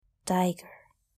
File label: tiger; domestic and wild